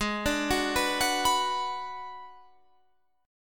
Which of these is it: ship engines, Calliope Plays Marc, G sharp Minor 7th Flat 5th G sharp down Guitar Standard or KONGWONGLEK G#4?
G sharp Minor 7th Flat 5th G sharp down Guitar Standard